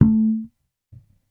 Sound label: Bass one shot Harmonic A, Electric Bass; Slate Digital BASSHOLE Sample Pack